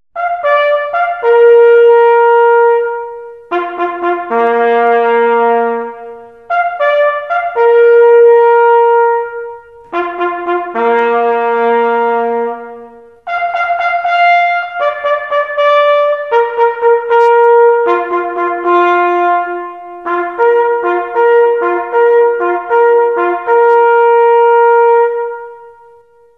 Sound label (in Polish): sygnaly Muflon Na Rozkladzie